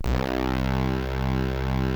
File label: KORG C2, synthesizer; Hollywood Edge; Giorgio Moroder Rare Synthesizer Collection